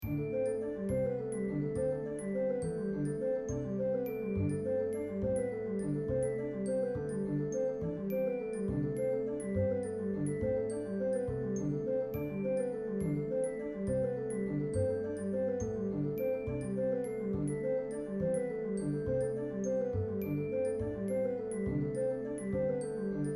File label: polyrhythm; assignment example